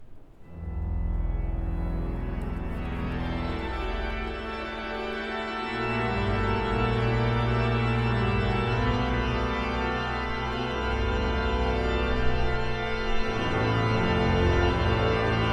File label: Organ Improvisation Gospel; Mass; 2012 Colloquium